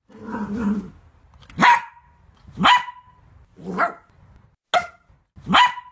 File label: Small Dog Barking; RailPro